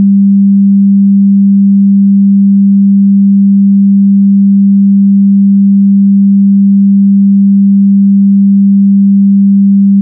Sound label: beats; KundtTube